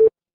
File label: ui beep